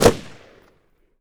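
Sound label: m 16 exterior shot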